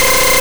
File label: Scary Face